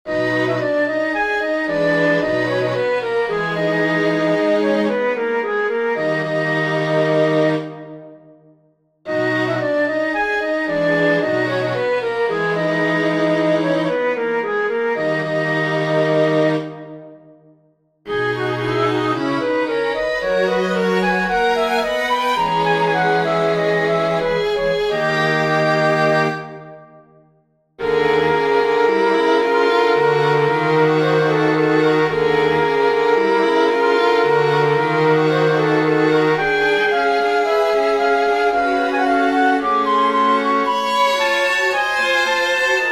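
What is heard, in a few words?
prelude for flute strings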